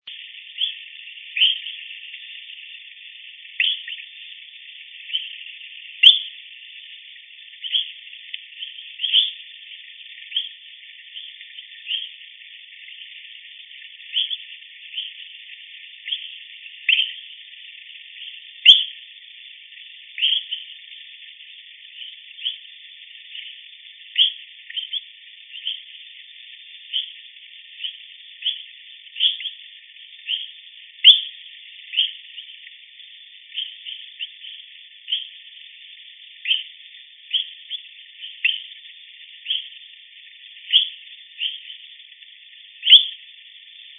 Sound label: Eleutherodactylus pipilans; eleutherodactylidae